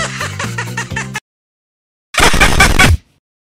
chaotic laugh; mortis

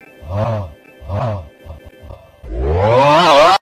augh distorted

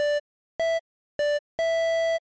boot sound

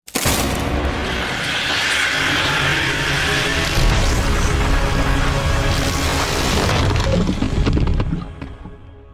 crucifix doors

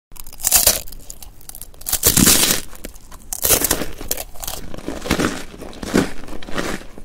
eating crunchy food asmr

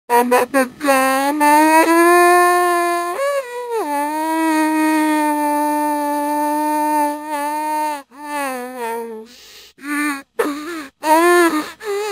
zombie scream loop

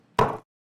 clunk

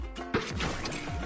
purple splat screen; bubble guppies

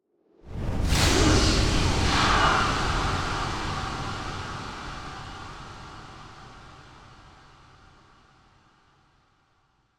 dark whoosh

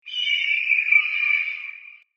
robo screech

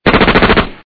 suppresive fire

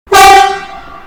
train horn; paris

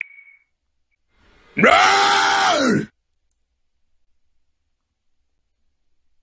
raughr

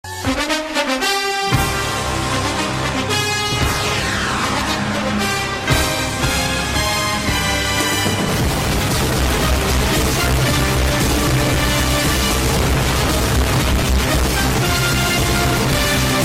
extreme music bass boosted

